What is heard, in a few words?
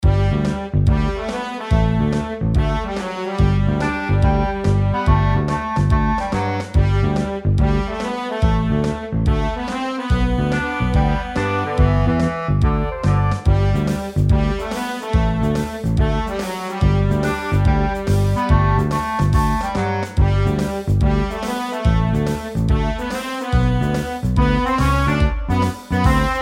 Carefree Theme with strings